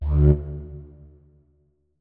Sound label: flute notes; Hideout Furniture